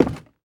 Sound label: wood, footsteps